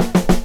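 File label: drum roll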